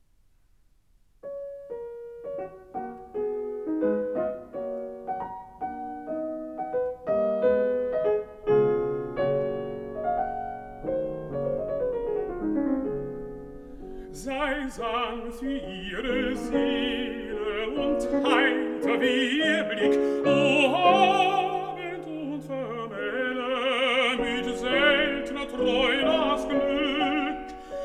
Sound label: song for voice & piano